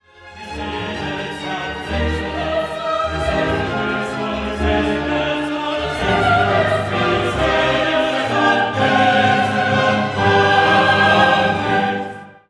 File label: Gloria Qui sedes